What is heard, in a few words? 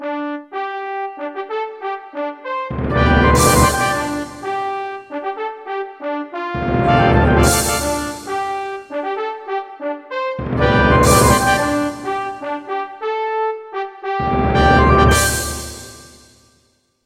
Victory; Azural Kane's UO Juke Box